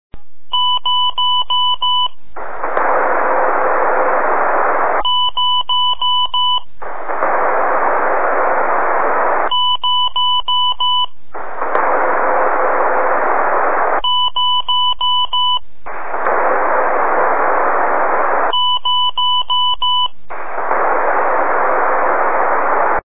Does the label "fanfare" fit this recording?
No